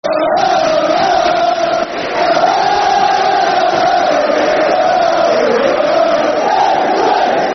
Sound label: Der Der Ipswich [Football Chants (Soccer Songs)]; fanchants; Ipswich Town Itfc